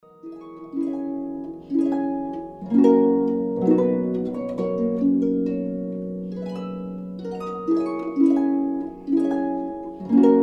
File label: bridal chorus